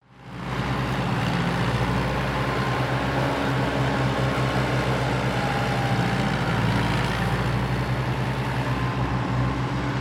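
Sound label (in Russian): звук ремонта дороги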